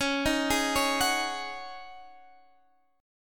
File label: C sharp Suspended 2nd Suspended 4th C sharp, chord; C#sus2sus4; arpeggiated; x,16,13,13,14,14